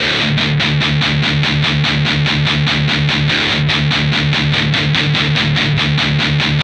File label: Guitar